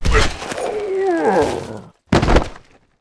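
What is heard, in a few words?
ARCANE scholar death, monster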